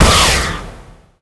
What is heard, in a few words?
hit